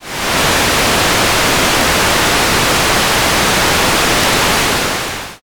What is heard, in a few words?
rain